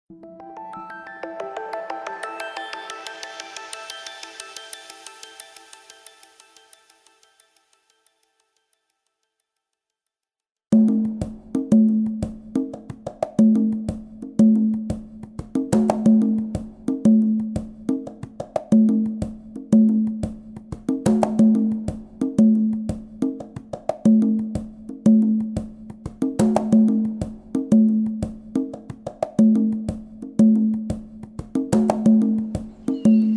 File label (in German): Schamanische Trancemusik